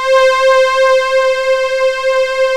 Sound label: KOBOL, SYNTH; East West - Global Sounds; CEE SYNTH